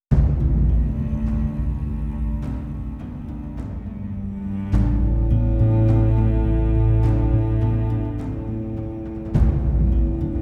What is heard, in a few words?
Deep Perc Beds Epic and emotional percussion beds and action loops; Cinesamples